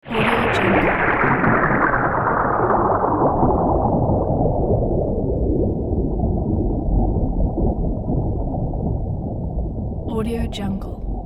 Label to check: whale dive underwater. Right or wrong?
Right